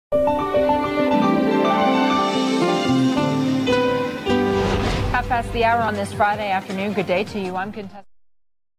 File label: jingle; msnbc